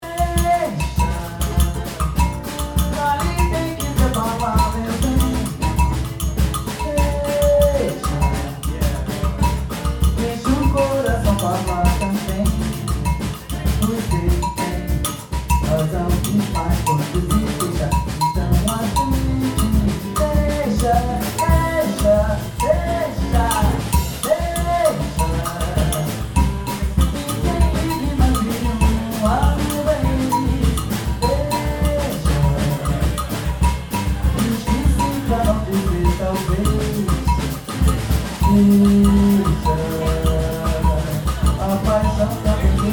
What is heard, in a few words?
bass applause